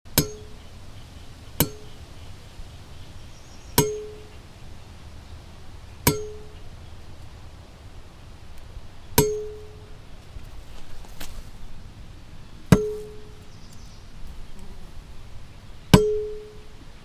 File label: Keys